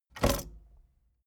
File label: tape deck bounce